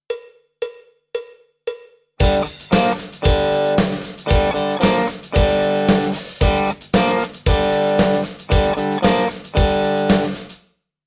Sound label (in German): Rock Lick, E-Gitarren